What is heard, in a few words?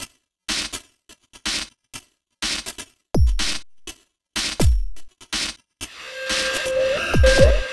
Robot Thunder, Modular Electronica